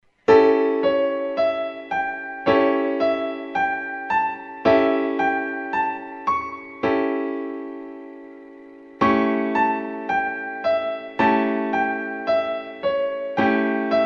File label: A7 Piano Chord